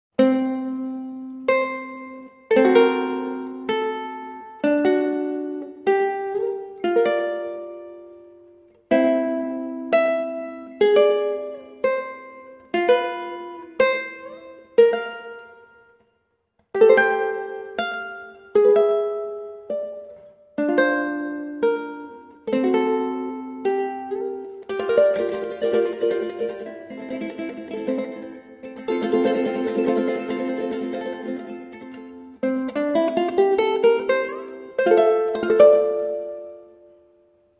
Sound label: uku